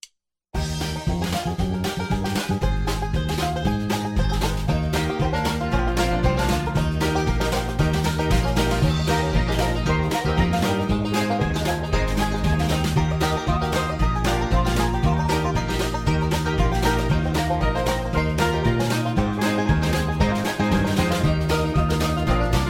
High Key

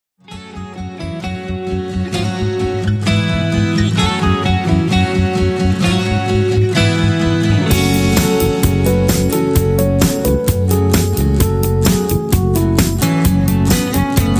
Instrumental Leads

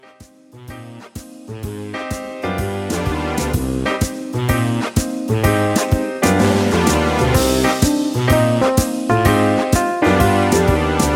Instrumental With Melody

